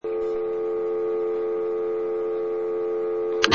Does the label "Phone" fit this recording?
Yes